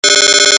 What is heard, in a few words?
bug; RTcmix FMINST. instrument